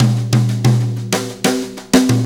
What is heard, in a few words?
Brushes Fill; Sonic Foundry (Sony Creative Software); Loops & Samples Drum Tools